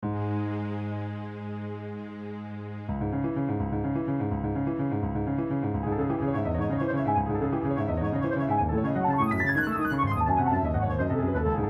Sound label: fantaisie impromptu remake; chopin